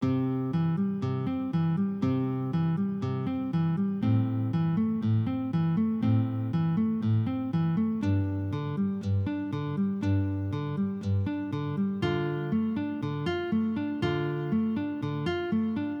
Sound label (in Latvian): Fingerstyle spēles tehnika; Ģitārspēles nodarbība